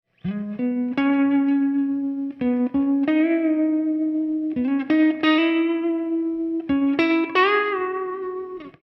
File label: Spring Guitar; PSPSpring